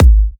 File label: Kicks G#; markus hakala